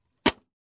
Closing small compartment click

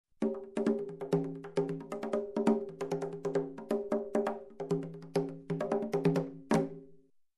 Conga Groove

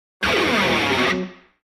Rock Guitar intro slide down

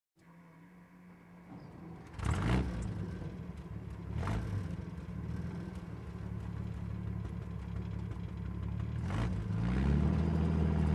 Tank engine sounds

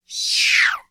Pterodactyl screech sound

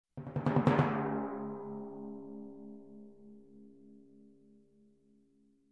Short Timpani Roll

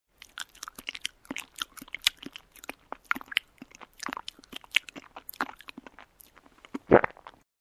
Watery Chew and Gulp

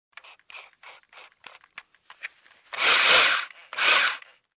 Impact Driver Tightening Screw

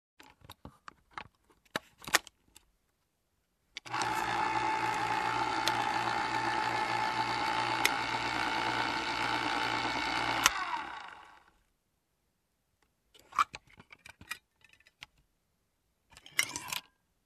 Soda machine pouring

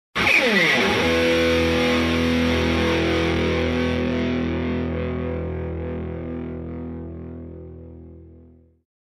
Heavy rock guitar chord stroke

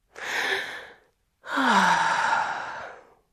Female Deep Sigh

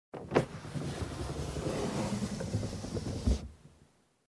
Manual car window downlift

